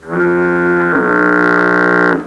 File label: fog horn